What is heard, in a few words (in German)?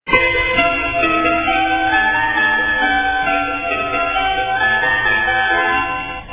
orgel; 古いオルゴール